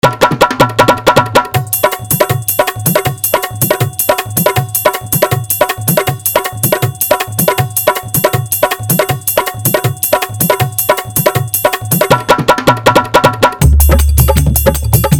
Desi Dhollki Beat Music Only For Dance Mix

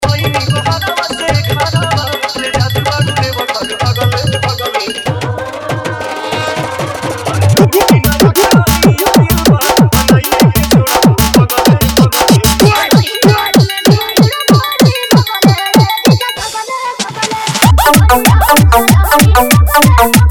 Dj Remix Song Hi Fi Competition ToinG Bass

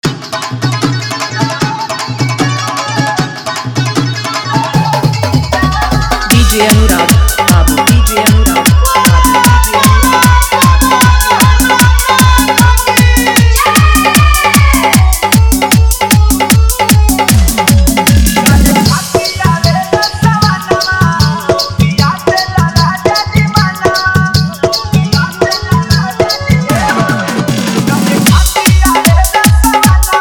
Bolbam Full Dhollki Bass Rod Dance Mix

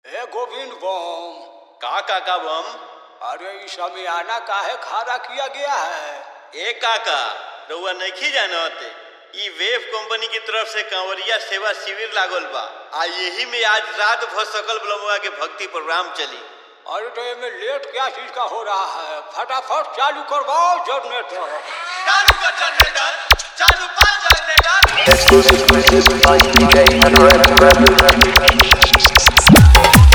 Bhakti Old Full Dhollki Bass Dance Mix

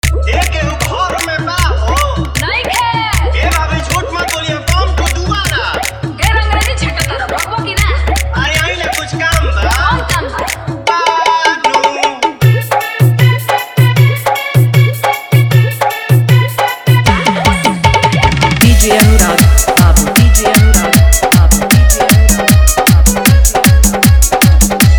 Navratri Song Hi-Fi Dhollki Mix

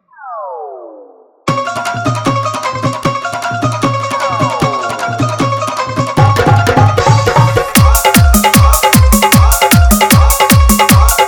Kirshna Bhajan Full Dhollki Bass Dance Mix

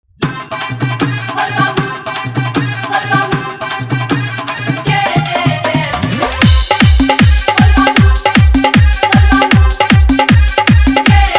Bolbam Dhollki Bass Rod Dance Mix

